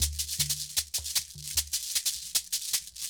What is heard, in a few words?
Combination, World Percussion, Xylo, Drones, Shakers, Talking Drums, Stick Drum